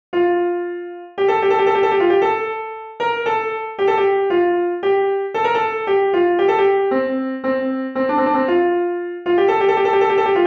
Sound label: Trills Piano Generated